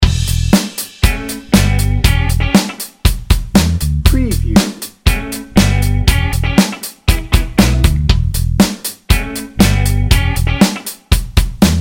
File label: funky guitar bounce light